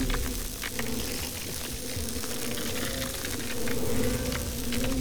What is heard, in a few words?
item frozen fist loop; LoL